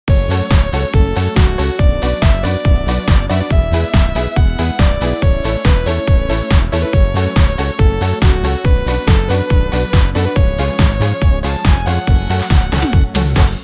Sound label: Milano Disco